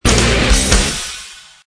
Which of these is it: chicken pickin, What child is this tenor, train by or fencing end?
fencing end